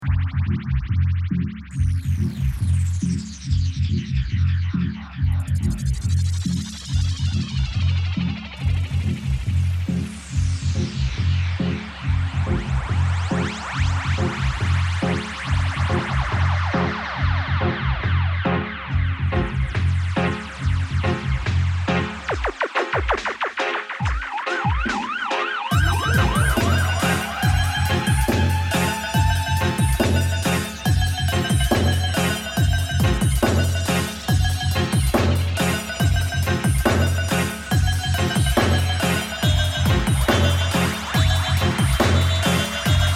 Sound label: dubstep fade out